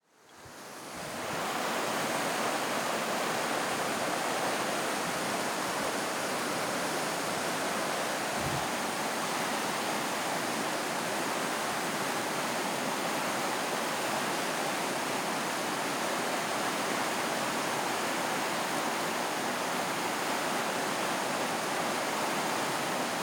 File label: PowWow Waterfall from Bridge; Menominee PowWow 4 Aug 2023